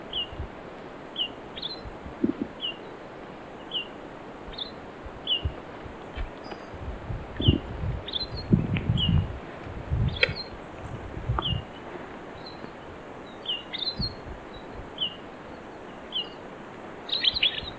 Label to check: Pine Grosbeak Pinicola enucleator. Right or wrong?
Right